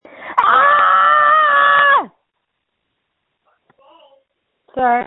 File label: Scream